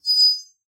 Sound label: Creepy Metal Ringing; SoundsCrate